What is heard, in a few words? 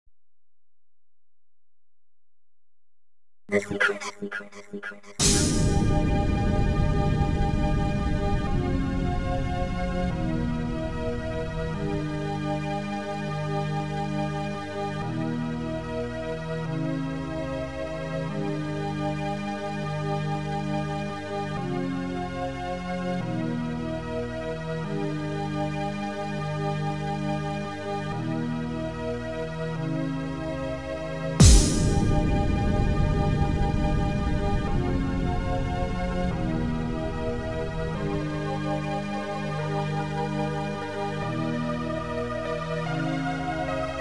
Amiga Music; Thunder Compilation 2